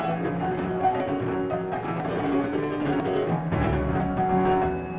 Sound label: metal guitar